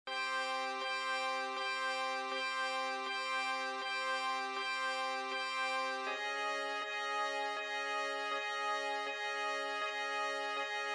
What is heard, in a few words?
Boombox